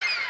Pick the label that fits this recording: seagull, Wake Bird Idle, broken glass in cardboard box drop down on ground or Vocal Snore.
seagull